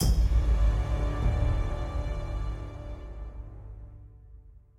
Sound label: Calm Death; Forbidden City